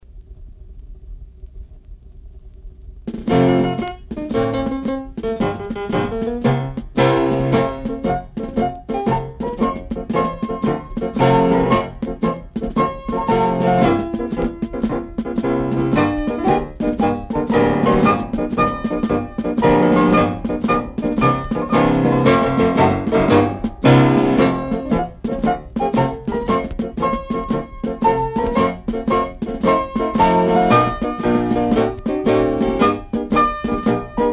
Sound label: marching